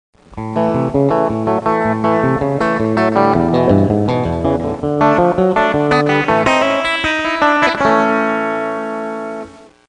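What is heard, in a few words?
country rhythm, guitars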